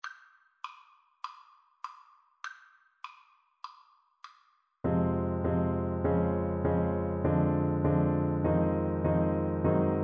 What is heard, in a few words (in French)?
quatre mains, Piano Duet